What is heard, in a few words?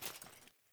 Grunt Foley Movement Armour; Characters; Enemy; Melee Grunt; Sinecure